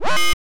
vehicle low health; Original TK Sounds

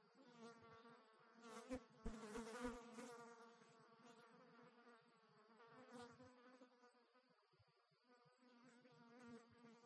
flies; Golden Crayon Sounds

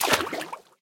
wall water; Golden Crayon Sounds

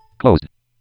Folder Closed; ESAN VO Sound Schemes; ESan Eloquence VO Sounds Pack

